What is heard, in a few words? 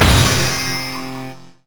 powerchord; sona; pax sona